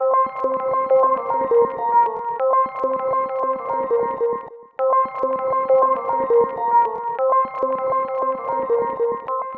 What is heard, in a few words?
Arp, Sequences; Electronica